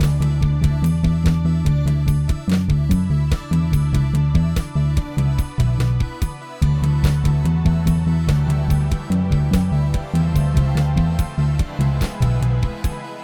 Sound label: level alternate loop; atelier godot; AlphaRaquette